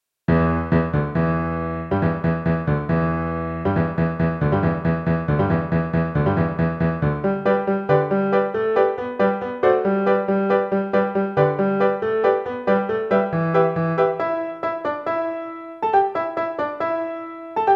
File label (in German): Pentatonisches Lied; expressionismus